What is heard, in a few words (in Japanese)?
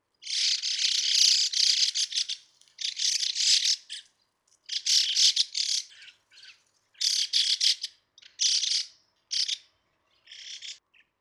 mukudori